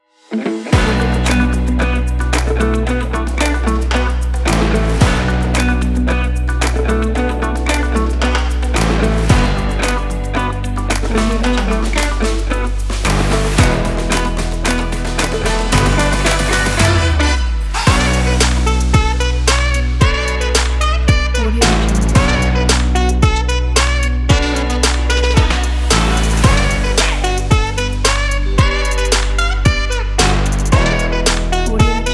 stylish funky trap

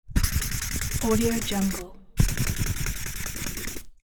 marker

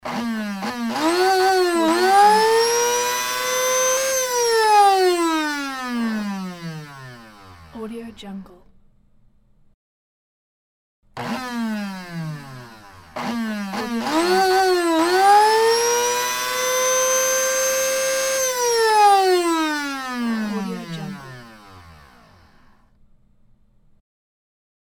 coffee mill

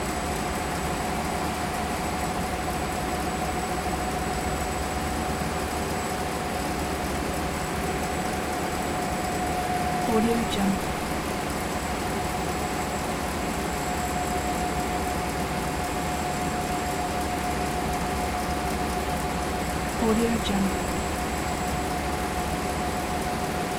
ferry boat engine room turkey loop

